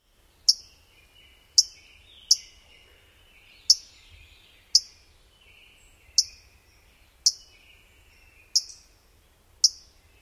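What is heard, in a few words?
Louisiana Waterthrush, alarm calls number 1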